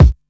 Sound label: studio bass drum sound b key; Channels Mono